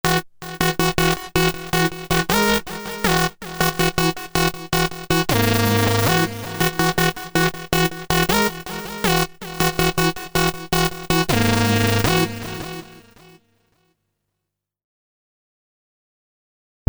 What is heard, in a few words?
korg z1; Z1 promo cd 2